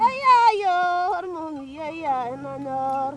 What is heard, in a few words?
VOC